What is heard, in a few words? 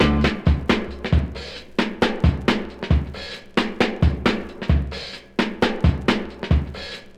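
134 bpm hq drum beat d key, kick tuned to the D note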